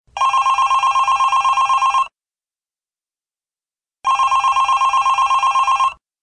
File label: by phone